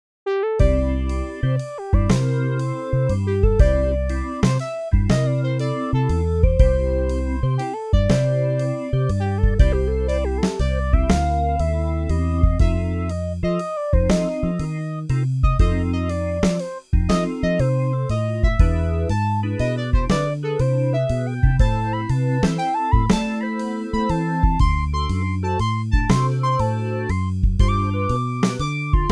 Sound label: MIDI blues; spellman studio